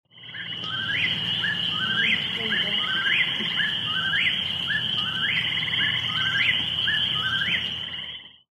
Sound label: Whip